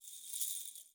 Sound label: Jingle Bells Rattle